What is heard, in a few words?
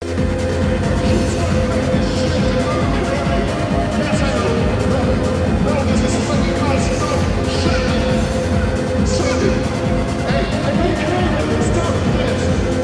ringtone